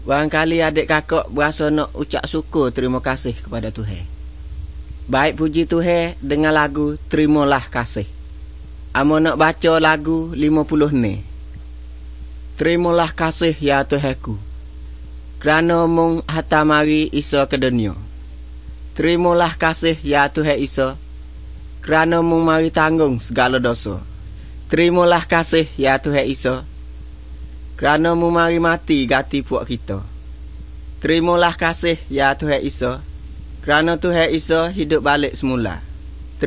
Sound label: songs malay pattani